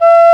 Penny Whistle E3; Multisamples; Digital Sound Factory Planet Earth

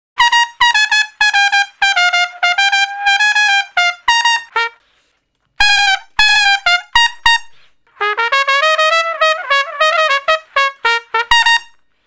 Trumpet Straight (Bb), Solos, Horncraft for R&B; Crimson Blue and Fabulous Horncraft 4 RnB

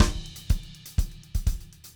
SAMBA, drum

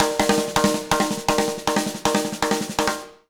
Jazz Latin Drumloops; BBEAT; USB Soundscan vol.08; AKAI; 90s sample CD

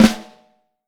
FLAM, Snare Drum; Vintage Snare Drum; Q Up Arts; Johnny.C's Vintage Snare Drum

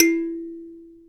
Thumb Piano E3; Multisamples; Digital Sound Factory Planet Earth

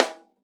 Drums Acoustic, RAW KIT; AKAI; 90s sample CD; USB Soundscan vol.10; RAW KIT 5

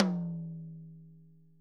ROTOTOMS; Sonic Reality

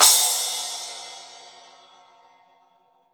CRASH, Drums Acoustic; AKAI; 90s sample CD; USB Soundscan vol.10; RAW KIT 7

